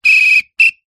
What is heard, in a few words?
sport whistle soccer x2 bursts med short